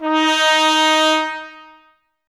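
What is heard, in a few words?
trumpets D#, brass; 90 s Sample CDs; Miroslav Vitous; Woodwind Brass Ensemble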